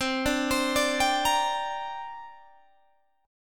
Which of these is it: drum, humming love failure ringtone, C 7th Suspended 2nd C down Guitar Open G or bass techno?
C 7th Suspended 2nd C down Guitar Open G